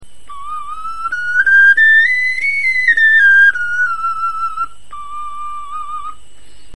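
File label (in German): tonleiter, Knochenflöten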